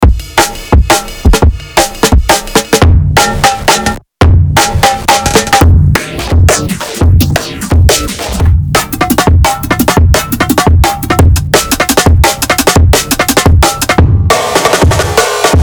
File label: Raw Acoustic D&B IDM Series Kit; Raw Acoustic 02 D&B & IDM KIT 02; Noise Design